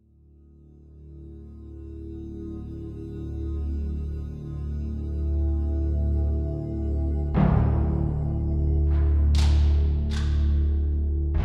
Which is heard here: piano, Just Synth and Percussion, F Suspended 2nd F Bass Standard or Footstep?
Just Synth and Percussion